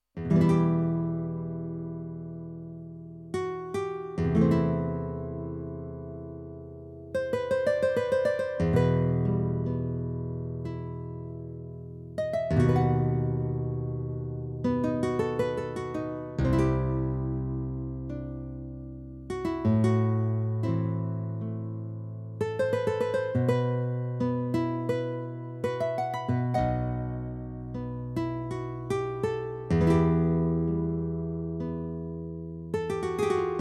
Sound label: Guitar